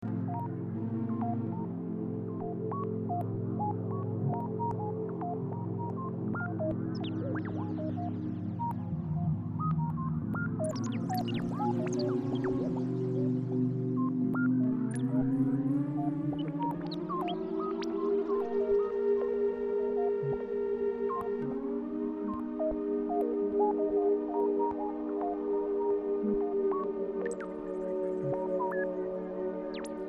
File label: string thing drone